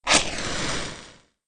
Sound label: light match